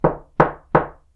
being knocked

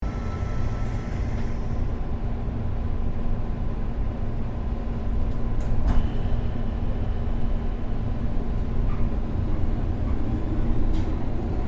metro speed up

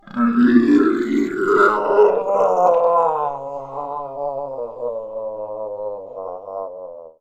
devil groaning; monster

